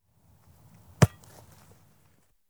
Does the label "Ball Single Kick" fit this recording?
Yes